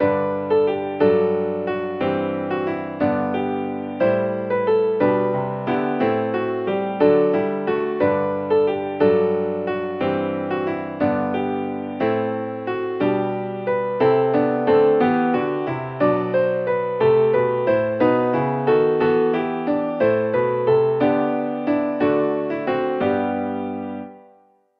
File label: accomp; Come And Seek; hymnary